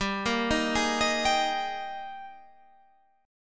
Ab Major 7th Suspended 2nd G, Guitar

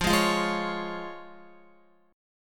F Minor Sharp 5th, F, Guitar; strummed; 4F,4A,5C,5G,5C,6E; x,8,8,10,8,9; JGuitar

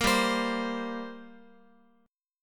G Minor Double Flat 5th, G, Guitar; strummed; JGuitar

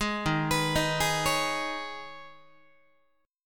Eb 7th Suspended 4th Sharp 5th Eb, Guitar; arpeggiated; chord

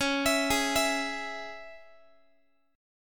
C sharp Minor, Guitar; C#m; arpeggiated; JGuitar

